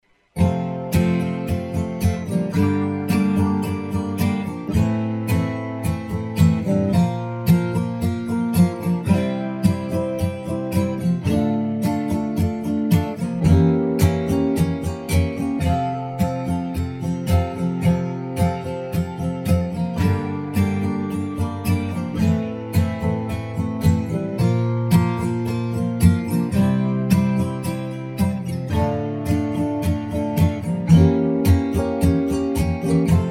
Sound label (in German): F Dur Akkorde